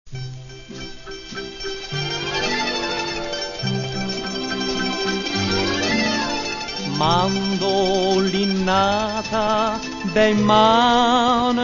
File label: Mandolinata